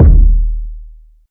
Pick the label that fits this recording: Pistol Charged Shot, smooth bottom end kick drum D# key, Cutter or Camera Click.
smooth bottom end kick drum D# key